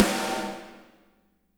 SNARES; Spectrasonics; Burning Grooves; BG SNARES 4

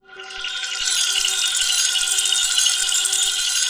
pad, 170 BPM, Drum & Bass; Ueberschall; Drum & Bass Resonance

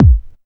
kick dance, techno trance drums; Techno Trance Essentials

